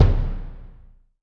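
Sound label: ORCH bass drum; ORCHPER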